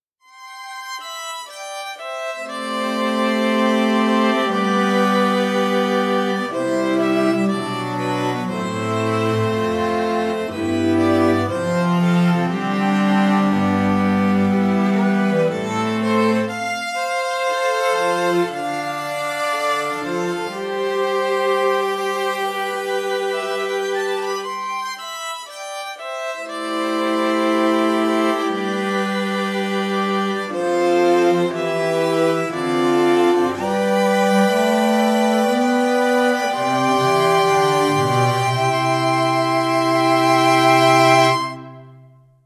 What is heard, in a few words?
String Quintet